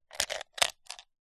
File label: gemstones inside plastic display case movements; zapsplat